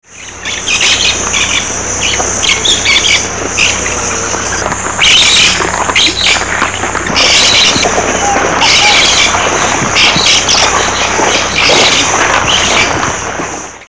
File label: blaze winged parakeet